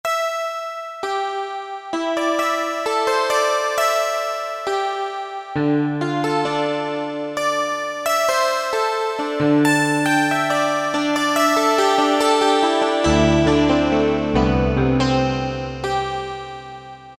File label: Hackbrett, Yamaha PSR-3000; Factory Voices